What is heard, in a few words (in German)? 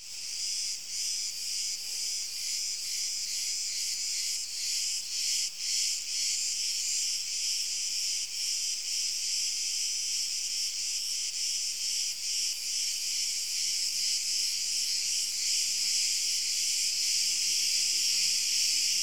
Grillen Tiefton Spiel